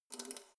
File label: letterbox metal key pull out soft